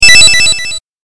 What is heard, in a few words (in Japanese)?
8bit 回復